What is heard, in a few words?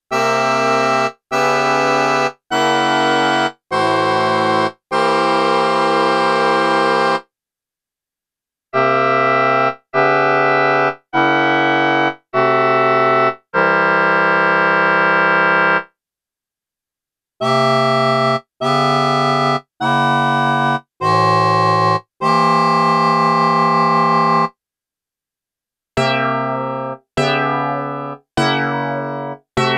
Hexany Progression